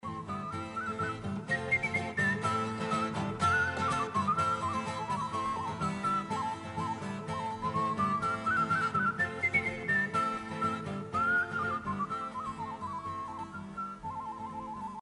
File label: finnish whistler ringtone; Suomalaiset Soittoäänet